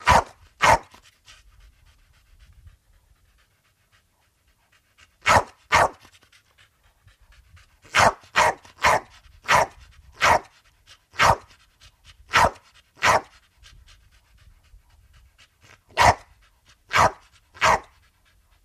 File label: Pit Bull Friendly Bar